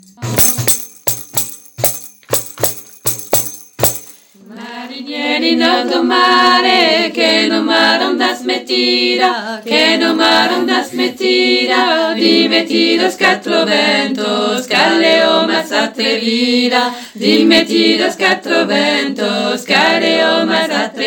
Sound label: muineira